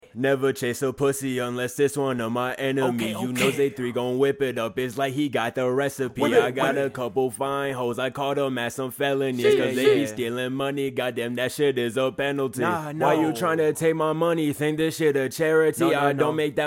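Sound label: hip hop 150 C sharp major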